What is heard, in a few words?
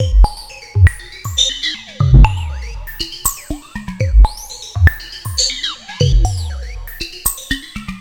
Percussive